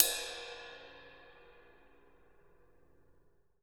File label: ride